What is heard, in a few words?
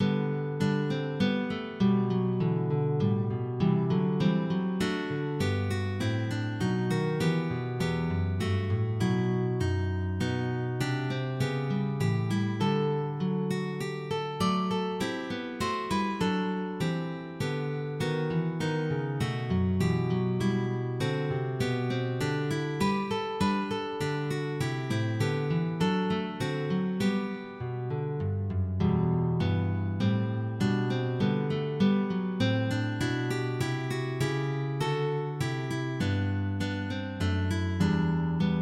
Chorale Guitar Ensemble